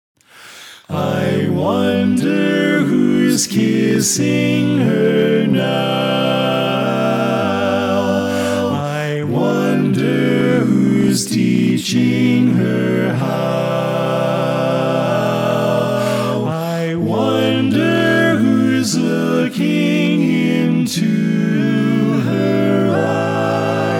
Tenor Left

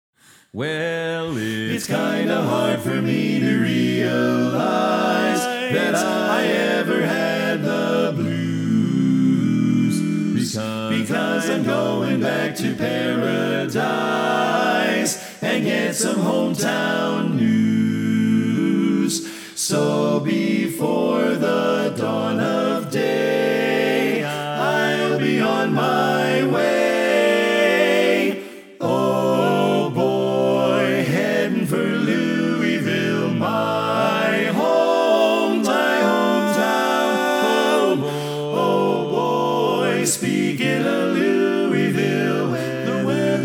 Lead Left

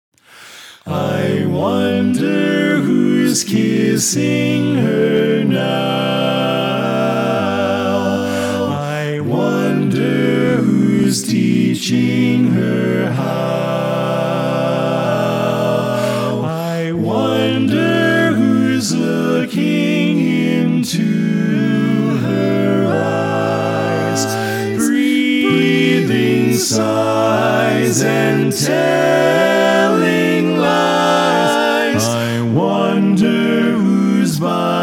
Bari, quartet

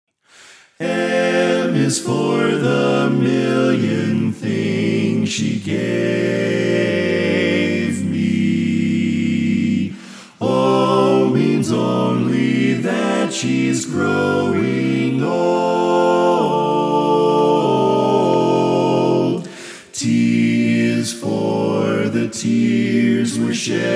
Bari Left

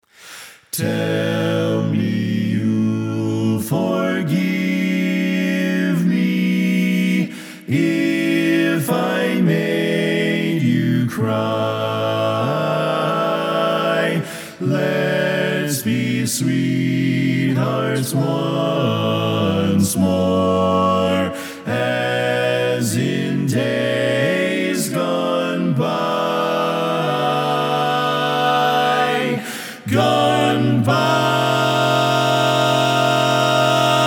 Tenor Left

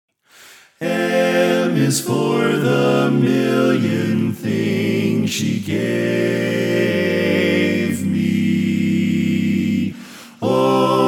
Bass, quartet

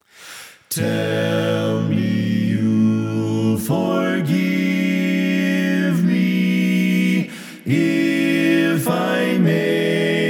Lead Left

